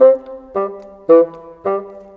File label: Bassoon, Cinematic; Sonic Foundry (Sony Creative Software); Orchestral Series VOL-3